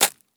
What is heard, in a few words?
Arrow Impact, Game Weapons; Military; Game Essentials